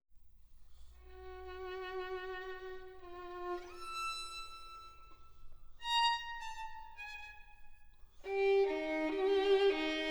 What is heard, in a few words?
Partita For Violin Solo, Praeludium